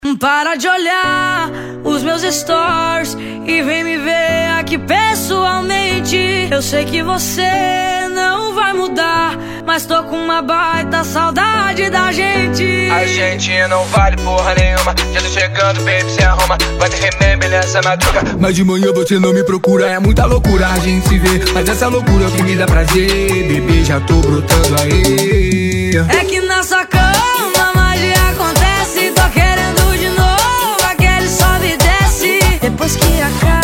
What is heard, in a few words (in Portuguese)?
especial piseiro e sertanejo